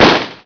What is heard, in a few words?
usp; SpecOps2 MPlayer Demo